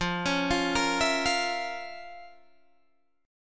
F Major 7th Suspended 4th Sharp 5th F, Guitar Open G